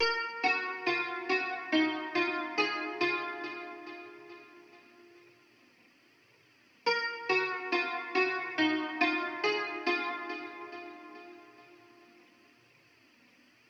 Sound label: 140 BPM D# Min Guitar; Melodies; Dark; Loop Stems; Cymatics; Cymatics - Monarch Melodies (Teaser); Reaper